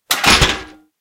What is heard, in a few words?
gear equip